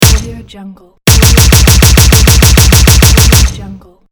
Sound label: machine gun shot